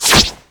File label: threatened; jinxie